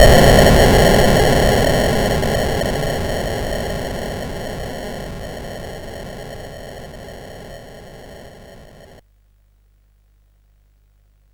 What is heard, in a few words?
Frantic, CSG8580R5